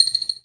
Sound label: zoom in